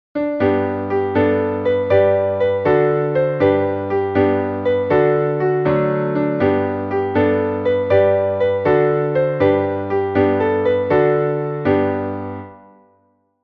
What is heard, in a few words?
chords, piano; i saw three ships